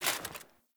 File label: Foley Action Armour Walk; Characters; Player; Deora Armour